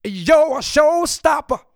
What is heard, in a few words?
free vocal samples